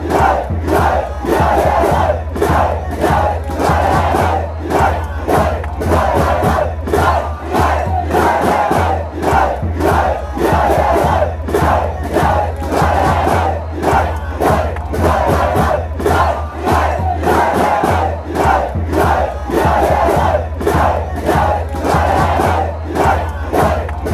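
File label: y dale, football chant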